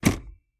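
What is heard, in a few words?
sedan emergency brake release; glove boxes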